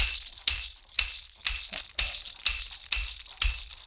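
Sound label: TAMB, tambourine; USB Soundscan vol.32; Roots Disco; AKAI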